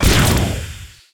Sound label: metal Jaw Laser Fire; Timez Attack